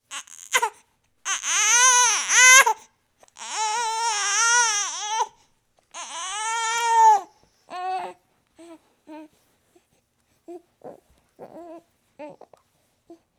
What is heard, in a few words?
baby cry